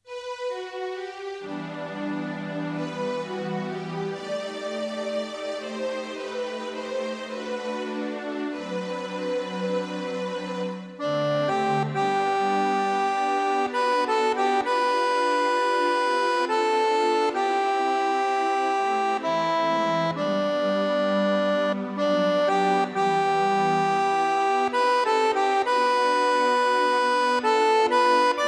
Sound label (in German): Amazing grace Akkordeon